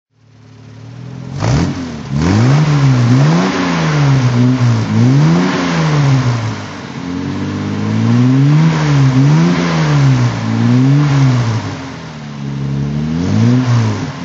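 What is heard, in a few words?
jaguar etype 42 ots throttle, Engine sound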